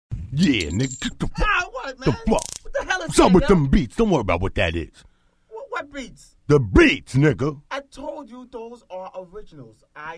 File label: interlude instrumental